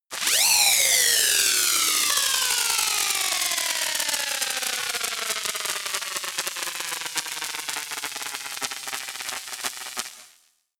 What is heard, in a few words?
noise crackle drop